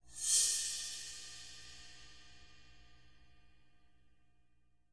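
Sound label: cymbal scrape, drum; 90s sample CD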